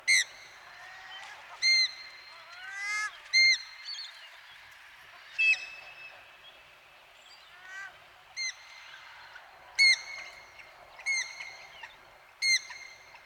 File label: ducks in lake; Golden Crayon Sounds